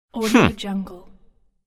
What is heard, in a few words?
male offended nose exhale